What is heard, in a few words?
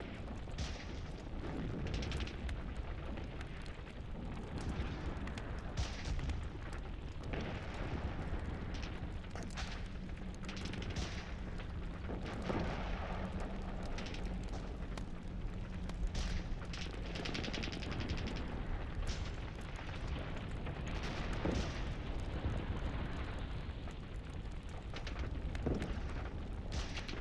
War Ambiance